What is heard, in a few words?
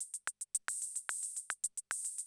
CR78, DrumLoops